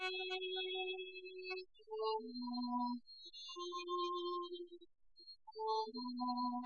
Castanets Violin Applause

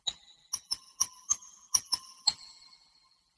Glockenspiel

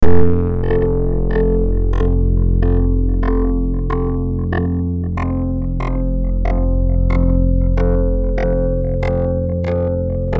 Synth Poly

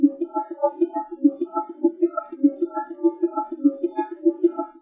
Bongo

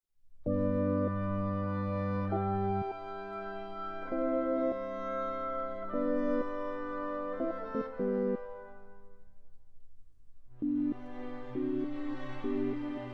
Sync Sine Soni

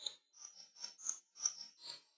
Glockenspiel

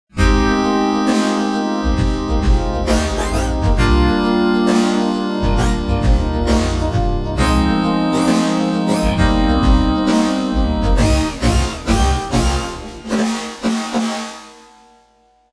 Pop

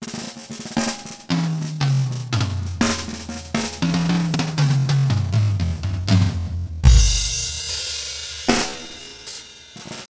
Drum Solo

